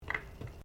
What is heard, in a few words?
Bottle Plastic Cap Plastic Pick Up; Various bottles; Materials